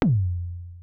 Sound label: Bump